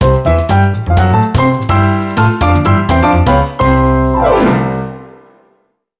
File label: Jingle; Gran Turismo 4